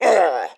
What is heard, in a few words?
Shield Damage; Pecheneg